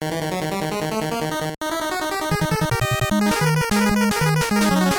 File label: boss battle; mega-man